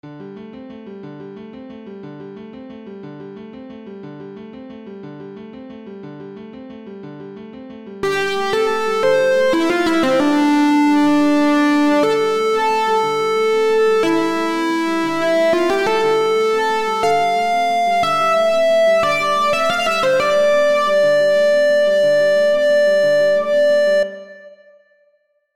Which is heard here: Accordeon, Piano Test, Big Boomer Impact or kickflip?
Piano Test